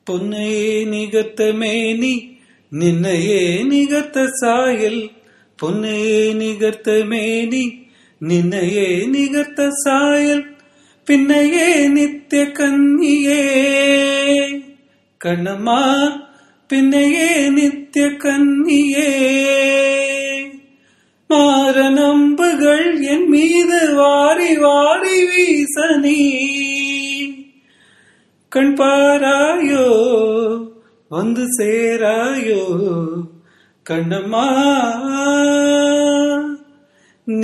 raga kalyana vasantham cine, carnatic music

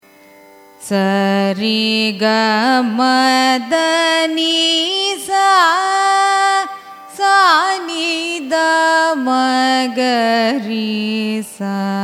raga sriranjani arohanam avarohanam; carnatic music

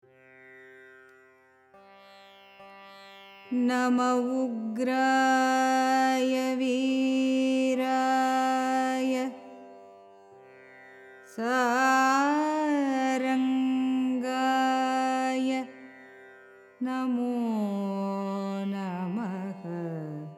raga surya carnatic; carnatic music

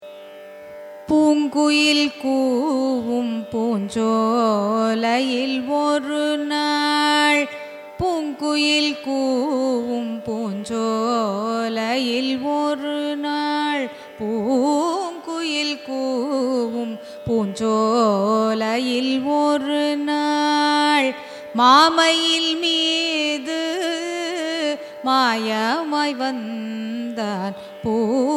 raga kapi carnatic; carnatic music

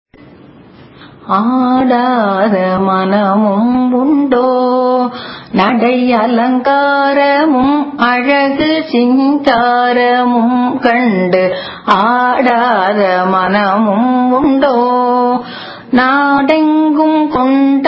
raga lathangi cine

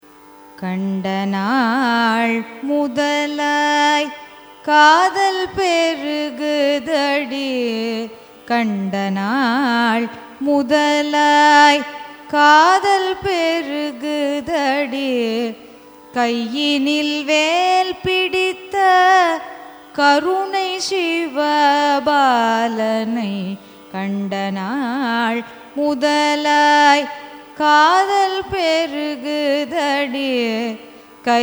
raga madhuvanti carnatic; carnatic music

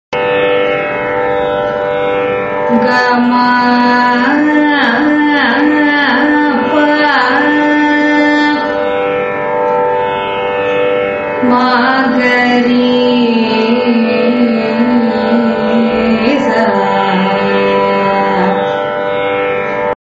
gamakam; carnatic music

